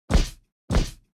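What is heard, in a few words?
Sound Monster Walk; Starbites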